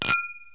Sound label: Hi-ball Clinking; KABOOM! - Wild and Wacky Sound Software; Household; Foods